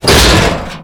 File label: metal box break; physics